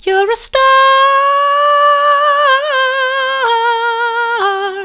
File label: vocal